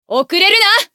移動; 迅雷のアドリアナ

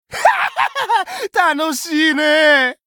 ダウン; ビンセント

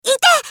被ダメージ; ビキ

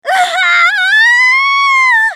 死亡; グレイス

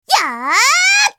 攻撃長; ルディン

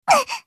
被ダメージ; ルディン

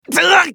被ダメージ; ガルシア

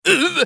被ダメージ; グルトルデ

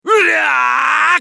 攻撃長; ナジブ

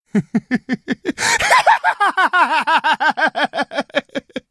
マスディストラクション; ビンセント

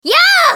攻撃; ソソ

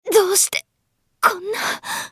死亡; カリュケ

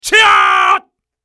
男･攻撃長; マスケッティア

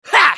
攻撃; パンファルロ

